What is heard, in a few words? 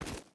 footstep rubber boots gravel run; Rust